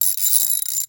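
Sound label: Ratchet; 1001 Sound Effects; Tools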